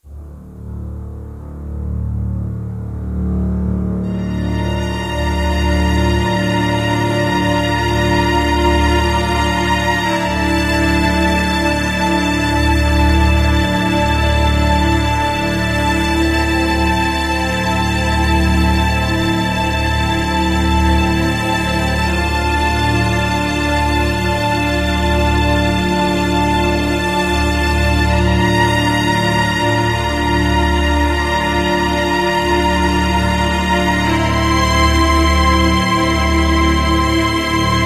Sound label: Droning Bed; World War II Combat: Road to Berlin; Xbox